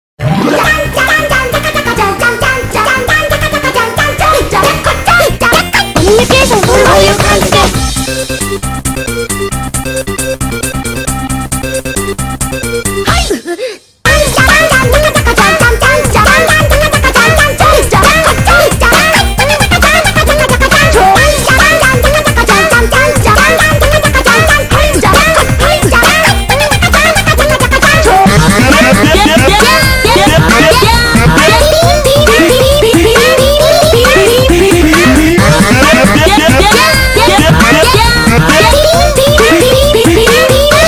anime veselaya yaponskaya muzyka 8 bit

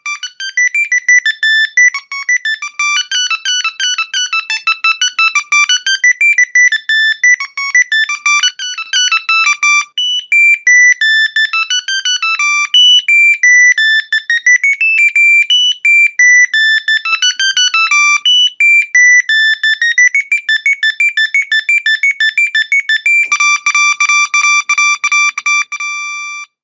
veselaya polifoniya

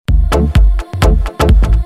korotkiy zvuk na soobschenie

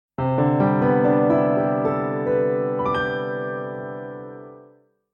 sms na royale zvuk sms kotoryy igrayut

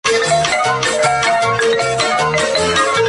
korotkaya pozitivnaya melodiya; lyubov i golubi sms

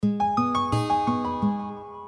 sms gitara i pianino

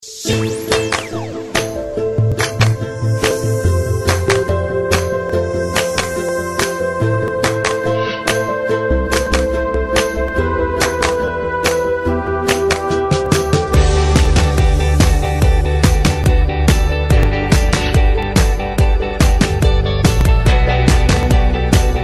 zvonok nokia rasslablyayuschiy

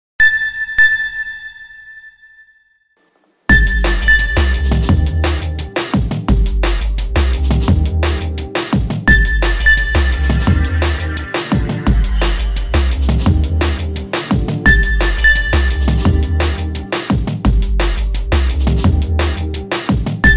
zvonok samsung galaxy a5

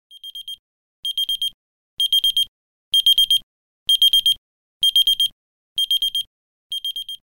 budilnik na chasah zvuk budilnika kotoryy igraet chasah

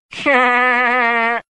smeh zabavnoe rington na zvonok telefona; smeh pitera griffina

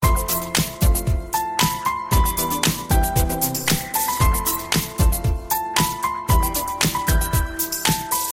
budnichnaya sms ochen krasivaya melodiya sms telefona

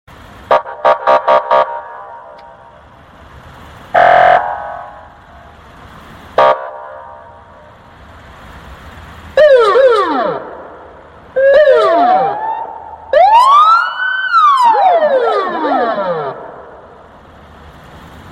specsignal sirena

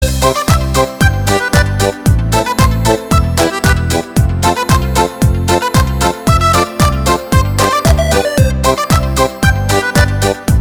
detskaya zabavnaya horoshaya melodiya

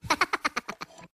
smeh minonov prikolnyy rington sms

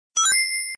zvon interesnyy zvon

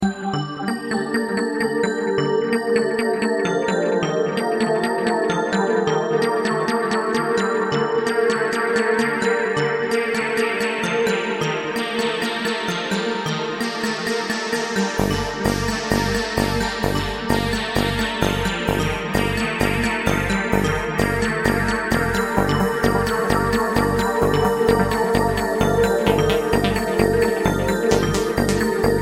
sms na blackberry z10 klassnaya melodiya sms

